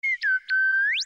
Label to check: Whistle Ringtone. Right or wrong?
Right